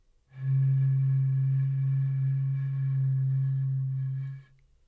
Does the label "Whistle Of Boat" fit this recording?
Yes